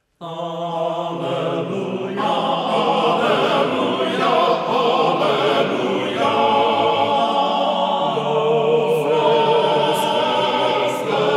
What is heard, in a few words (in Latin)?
Aleluja laus et gloria